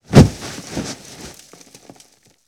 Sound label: Body Falls on a surface with leaves